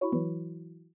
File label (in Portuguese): Alerta Suave; Alertas Erros